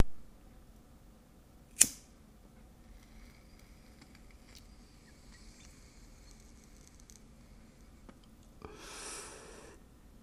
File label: Lighter Smoke Inhale